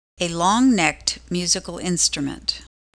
guitar

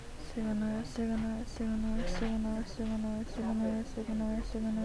signal

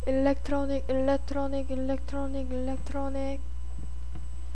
electronic

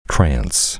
trance

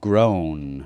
groan

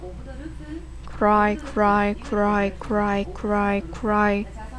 cry

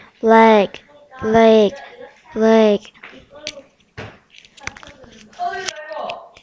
laugh

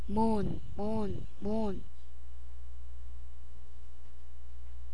moan

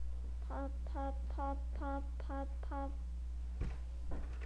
pop

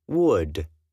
wood

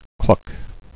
cluck

